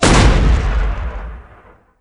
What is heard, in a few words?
rocket fire; cyberdemon